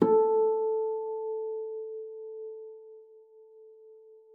FLAG G#4, harp; Xsample VOL-8; HARP+FX

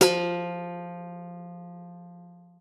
F3, guitar; Xsample VOL-2

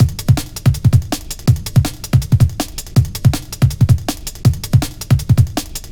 162, Jungle Breaks; Perpetual; Jungle Warfare 1&2

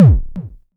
kick, Korg ER-1; Kit 9

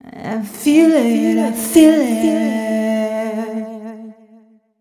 f 132 mel, Vocals, fem

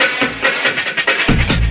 LOOP SD, Drum & Bass, 140 BPM

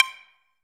perc C7; Greytsounds; Scott Peers Sound Engineering

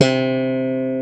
slap bass; E-MU Formula 4000 Series Vol. 3; Analog Odyssey; VK+ Instruments

